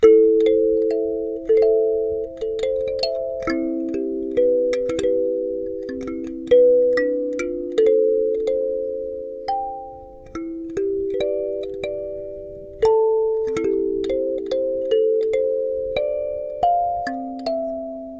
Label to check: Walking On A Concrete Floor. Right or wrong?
Wrong